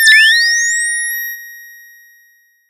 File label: pop, sound of a bike bell